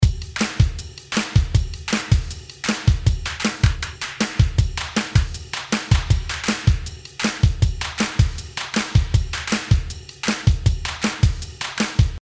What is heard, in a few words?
DRUMS REV